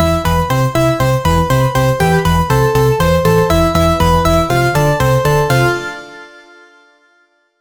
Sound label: a positive ascending melo, retro